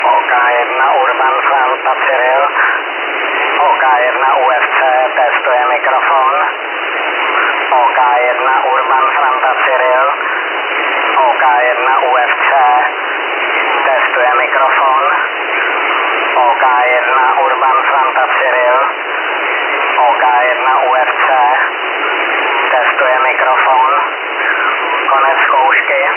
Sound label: 739.732 MHz USB; Small EME; SSB QRPP